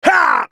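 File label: spellcast effort; ZhaoYunXinZhao; Character Voices - English; en US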